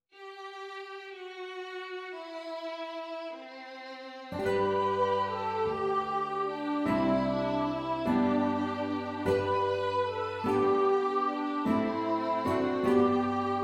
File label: ORCH; EnRoulentMaBoule3